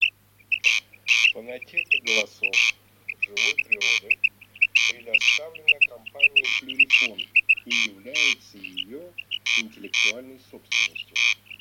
Перепел + Коростель Quaglia; plurifon

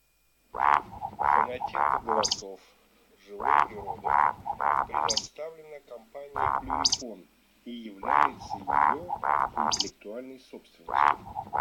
Вальдшнеп Beccaccia; plurifon

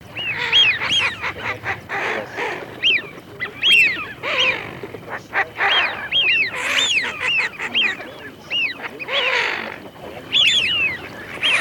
Свиязь Fischione; plurifon

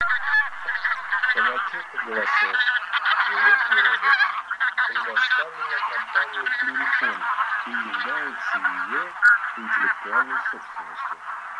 Гуменник базар Oche Granaiolle; plurifon

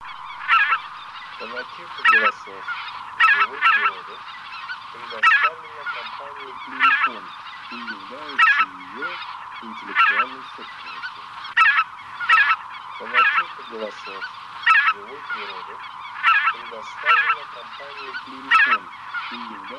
Белолобый Oca Lombardella; plurifon

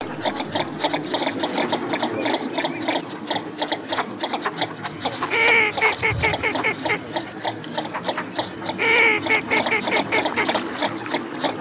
Широконоска Mestolone; plurifon

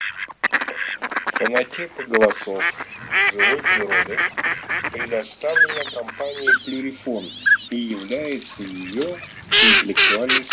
Утиный базар Anatre mix; plurifon

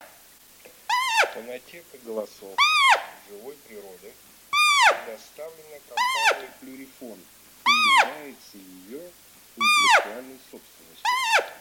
Косуля самка Capriolo; plurifon

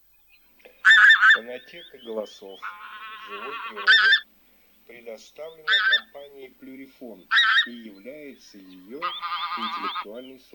Гуменник Oca Granaiola; plurifon

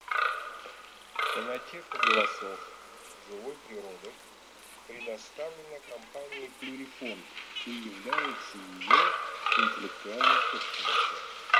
Лань самец Daino; plurifon

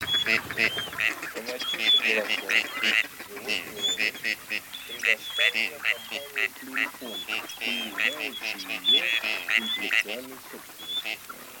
Серая утка Canapiglia; plurifon

